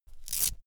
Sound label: velcro open cap quick